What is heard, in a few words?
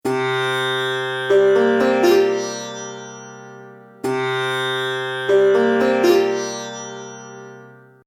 guitar